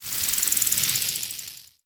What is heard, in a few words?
wugong